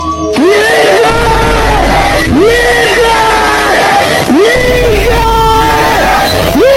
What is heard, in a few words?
charging up